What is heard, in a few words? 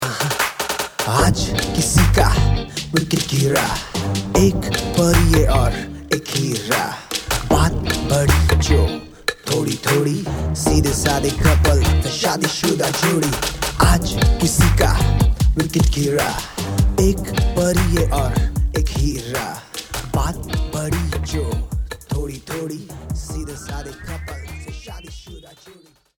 wedding wishing song Hindi rap male